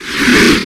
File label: unicorn wing